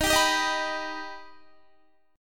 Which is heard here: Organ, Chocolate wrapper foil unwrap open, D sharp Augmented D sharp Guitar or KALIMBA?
D sharp Augmented D sharp Guitar